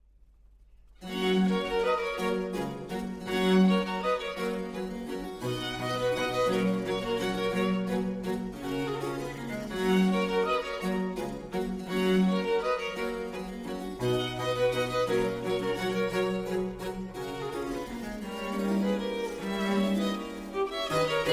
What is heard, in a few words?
Sinfonia Pastorale in G major for 2 Violins, Andante